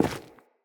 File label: Corrupted Sproutfolk Grunt Step Wood; Enemy; Footsteps